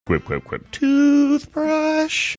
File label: singing quip quip quip toothbrush doo wop